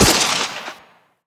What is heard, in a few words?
Sniper Shot